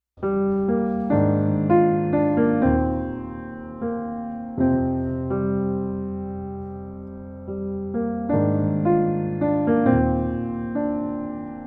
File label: PIANO